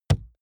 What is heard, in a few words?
wood impact hollow large soft